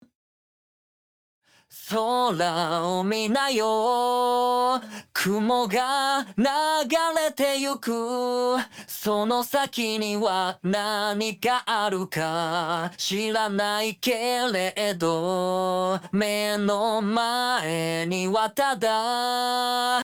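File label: vocal Off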